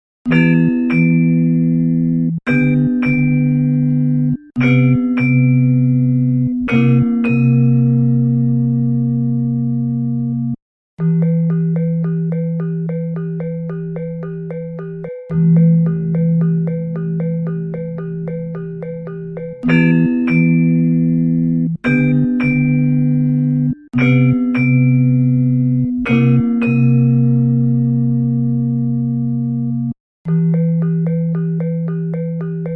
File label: Glass, gamelan